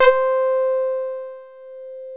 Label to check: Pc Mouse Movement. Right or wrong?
Wrong